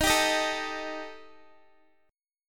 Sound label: D sharp Major Flat 5th, Guitar; strummed